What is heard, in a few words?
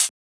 Closed Hat; FLPRO